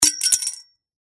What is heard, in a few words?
win to credits; American Poker V; wazdan